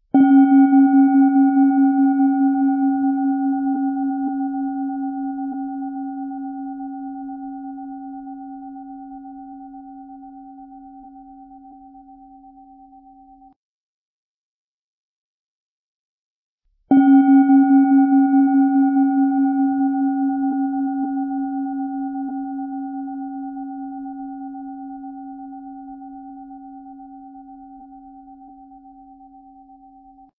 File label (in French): cloche